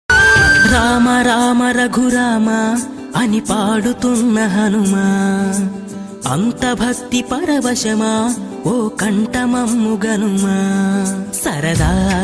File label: anjaneya swamy ringtones in telugu